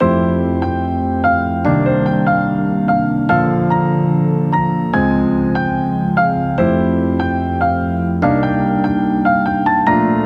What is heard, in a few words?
RB Hip-Hop Melody Feel Good 146BPM